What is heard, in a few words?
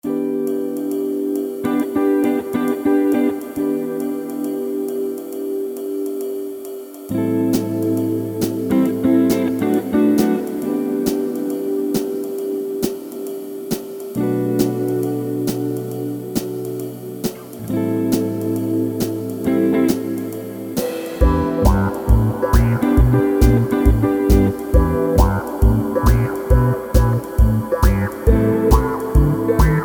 Orange Blues